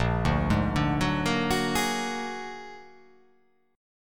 A sharp Augmented 7th A sharp, guitar

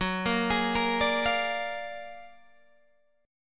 F sharp Augmented Major 9th F sharp Guitar Open G

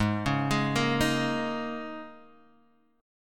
G sharp Suspended 2nd Suspended 4th, chord; x,x,x,1,1,3,0,0

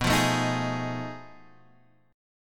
F 7th Sharp 9th Flat 5th B

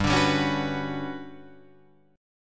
G Major Sharp 11th G down Guitar Drop D

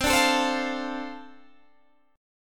D-Minor 7th C, chord

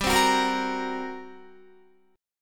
G Minor Major 7th Double Flat 5th, G, Guitar; GmM7bb5; strummed; 15,15,16,15,18,17

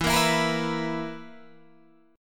B Major 7th Suspended 4th E

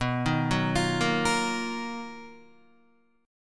B-Major Sharp 11th B down Guitar; BM#11 arpeggiated

